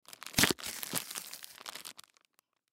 Plastic Bag Open, Plastic Bag Open Balloons Wrapper Tear Rip; Foley; Blastwave